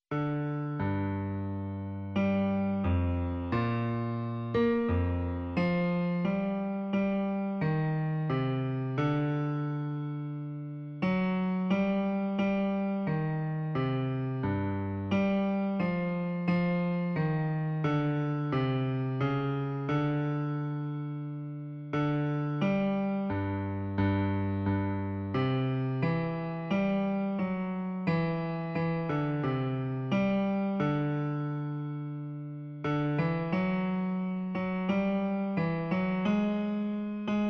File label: Bass